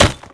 refinery add; garrysmod

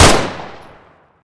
uzi; garrysmod

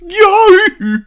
zombi idle; garrysmod

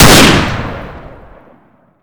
springfield; garrysmod

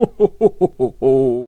ho ho ho; garrysmod

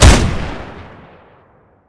nail; garrysmod

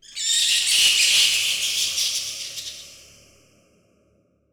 sewer bat; garrysmod

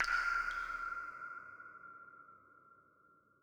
sewer drip; garrysmod

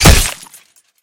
spear shatter; garrysmod

